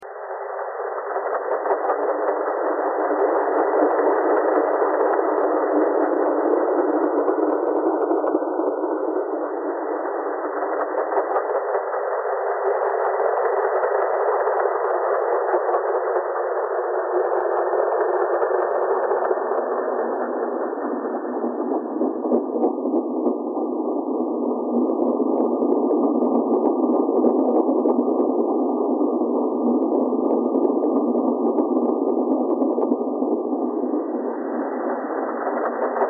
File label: thread buzz